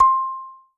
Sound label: Kalimba C3